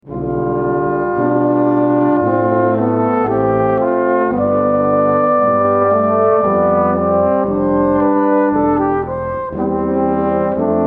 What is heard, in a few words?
Air on the G String